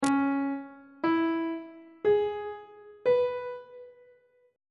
d flat phrygian 7th chord 1 is d flat minor 7th on treble clef